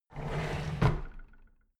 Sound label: drawer big clatter close heavy